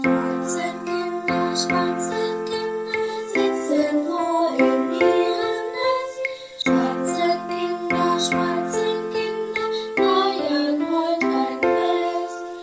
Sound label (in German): neuer Satz mit Gesang